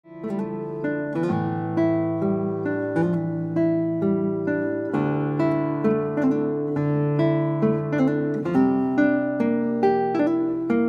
Guitar

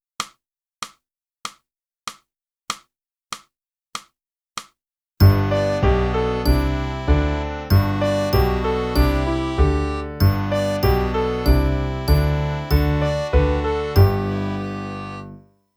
flute; Boosey Woodwind Method: Flute (Book 1)

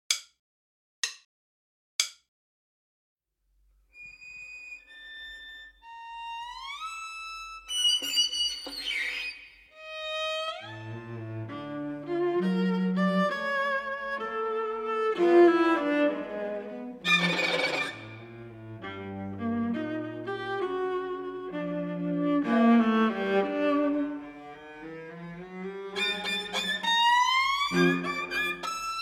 minus Cello

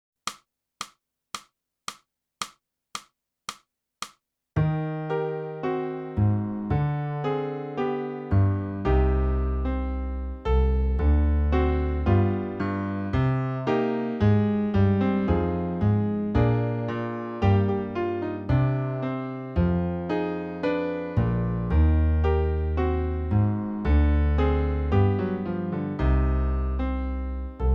sax lullaby

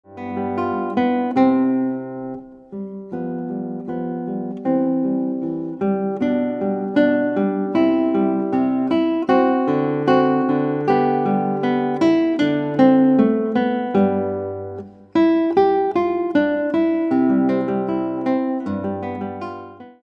Guitar

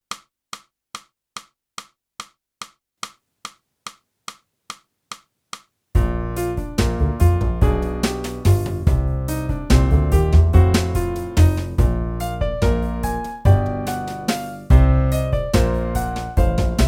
clarinet; boosey method

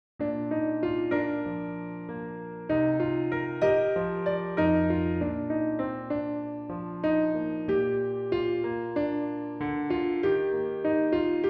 flute transposition accomp

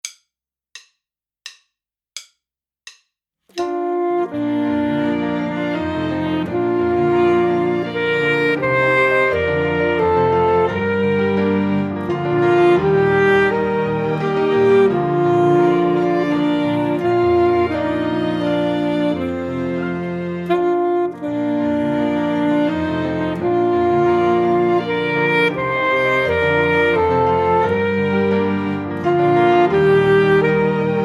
Duo Teacher Sax

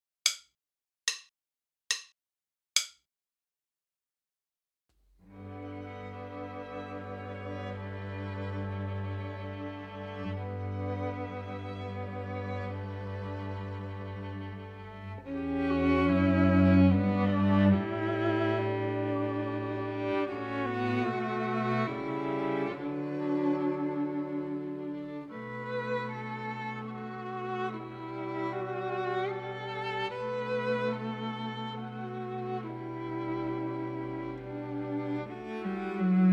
minus Violin I

